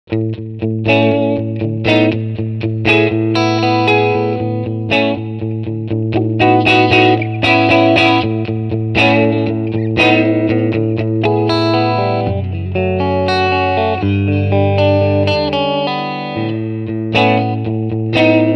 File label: Channel Clean Tone